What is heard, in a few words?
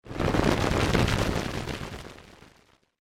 Distorted Crackle Sound; Noise Music